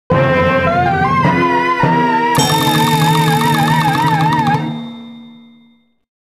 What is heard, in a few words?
Failed; Taiko no Tatsujin Doka to Oomori Nanadaime; PS2